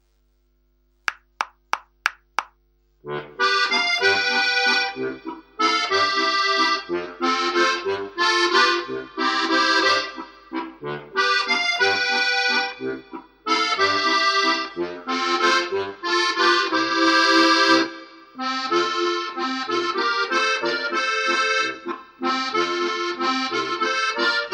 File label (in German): Walzer (2. Stimme)